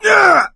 hurt critical, survivor voice; Left 4 Dead 2; coach

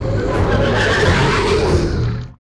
Entity Strike; Sid Meier's Civilization 4 Complete; Beyond the Sword; Units

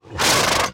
horse snort; Mount&Blade Warband

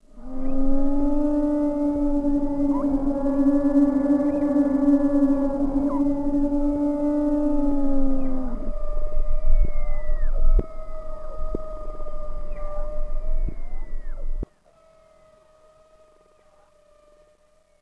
Scary Wind; Sid Meier's Civilization 4 Complete; Beyond the Sword

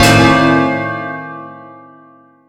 survival player rec

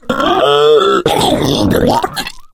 female boomer alert; voice; Left 4 Dead 2

